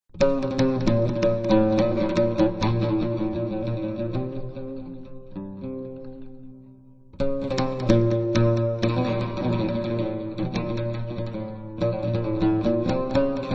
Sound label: Oud Taxim